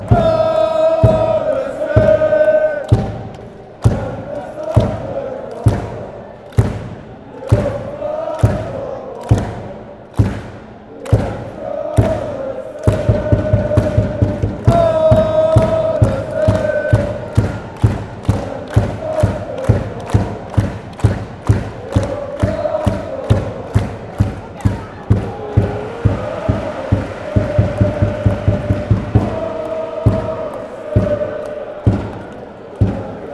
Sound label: aalesunds chant, fanchants